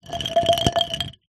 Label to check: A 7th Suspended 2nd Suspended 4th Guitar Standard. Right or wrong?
Wrong